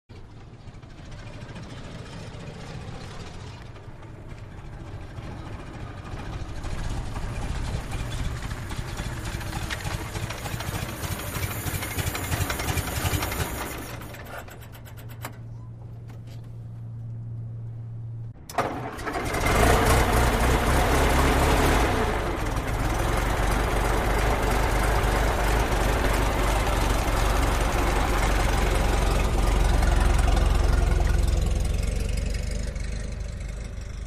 Model T Ford; In Slow, Stop, And Off. Start Close Perspective, Slow Away.